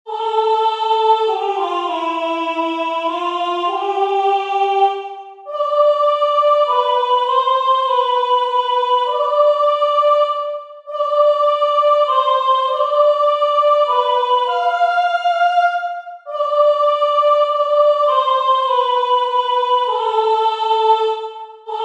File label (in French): plusbel Soprane